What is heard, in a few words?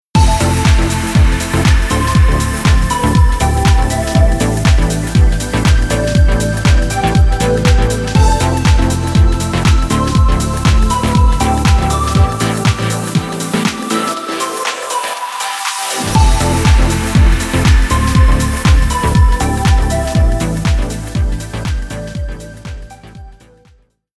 Synth Filter